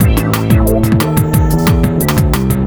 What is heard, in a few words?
ringtone, electro; Google; NewWave Labs